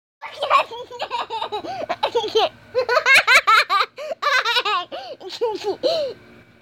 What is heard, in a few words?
Cackling 6 y old